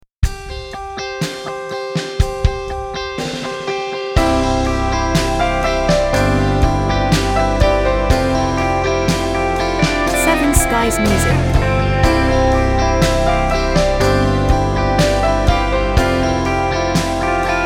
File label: Peacefully (jingle)